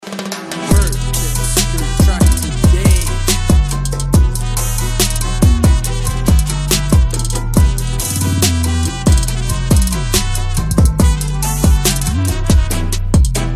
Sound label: Trap & bass mix bass boosted